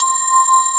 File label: METAL BAZZ